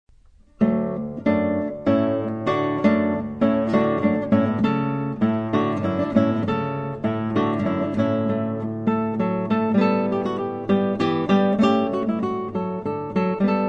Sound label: Hornpipe Solo